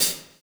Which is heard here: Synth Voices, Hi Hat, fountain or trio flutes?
Hi Hat